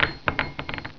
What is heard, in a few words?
pindrop